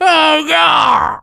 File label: Civilian pain critical death